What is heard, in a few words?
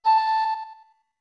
Flute A med